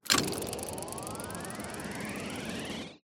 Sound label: wheel move